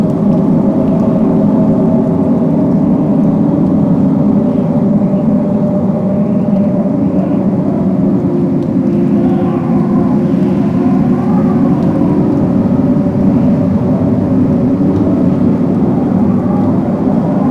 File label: Ice Interior Ice Cave Open Amb; Warframe; Corpus; Ice Planet